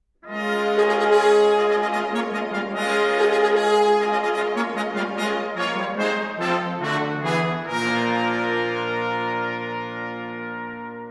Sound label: Fanfare; Dukas